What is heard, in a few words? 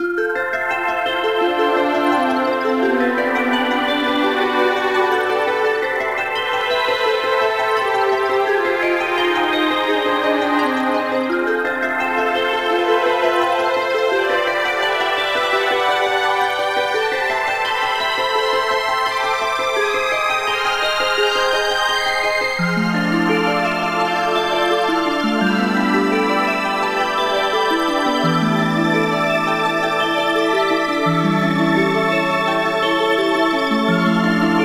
Bonus; Zenses Ocean; nds gamerip